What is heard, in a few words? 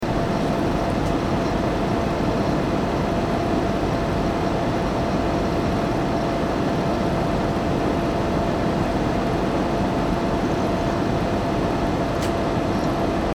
computer idle ambient loop